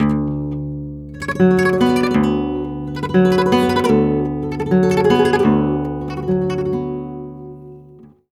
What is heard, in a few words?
Flamenco, Guitar, Tremolos, d